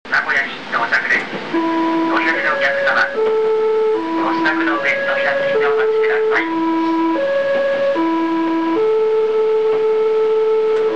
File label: train; omocha